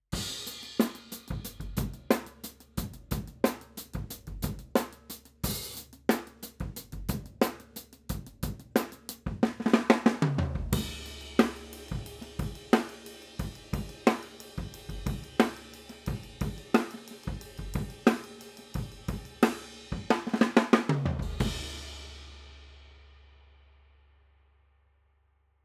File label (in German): Overheads Niere; sE Electronics T2